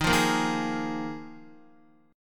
D sharp Suspended 2nd Suspended 4th D sharp Guitar; strummed